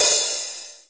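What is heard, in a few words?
HH OPEN, open hi-hat; Hip Hop; style kits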